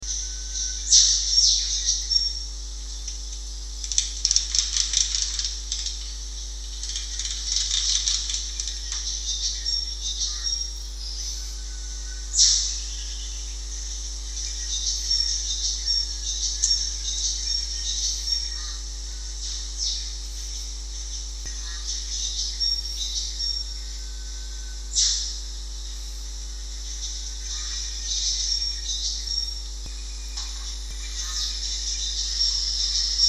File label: montville sounds